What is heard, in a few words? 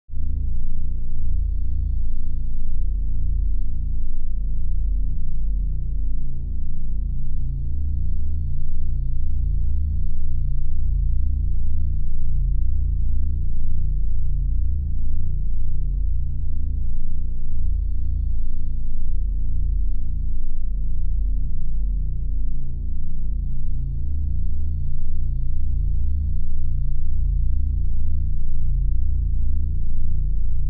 Pulsating signal with low frequency and hum; radio signals